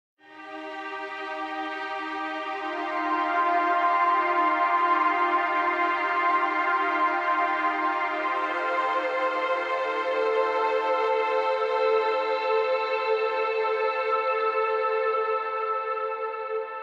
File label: Hangar Ambient Random; Ambient One Offs; Warframe; Railjack